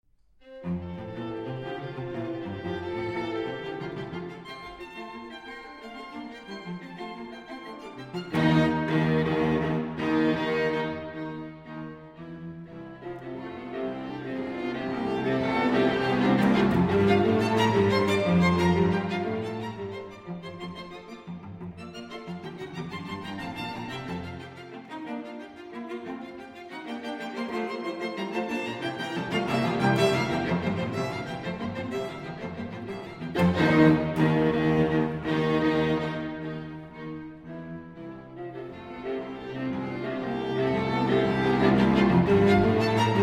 String Quartet in F Major

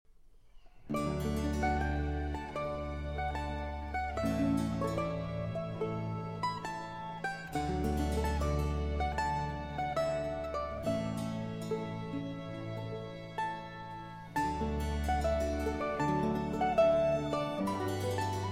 Concerto in D Major - II. Largo; Vivaldi; RV 93

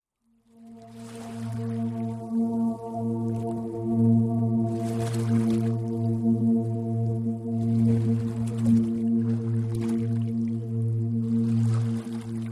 Body Regeneration 192 Hz

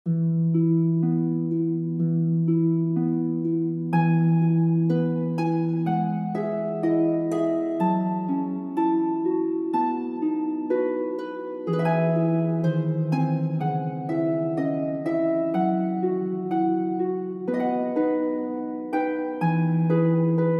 Harp

